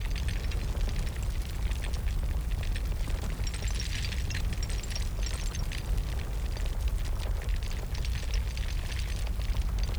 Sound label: Earth Sphere Fly Loop; TANK; 3Souls